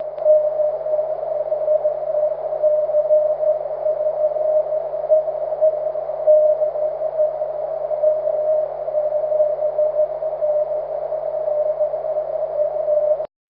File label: cw, 23 cm EME; w7bbm